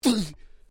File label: Male Vocalization Hun; Male vocal sounds; Exclamations; Voice